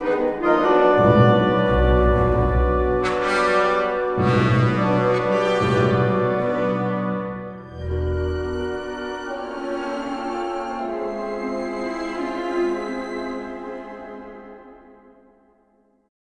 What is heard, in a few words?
music flourish, intro